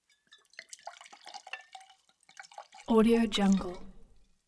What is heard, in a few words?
pour drink in glass; prosoundfx